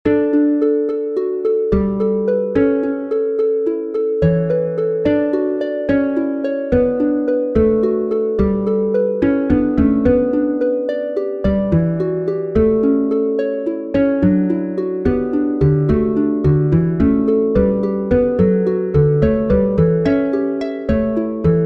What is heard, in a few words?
MZ3 Triton